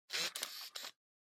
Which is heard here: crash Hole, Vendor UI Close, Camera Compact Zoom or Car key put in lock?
Camera Compact Zoom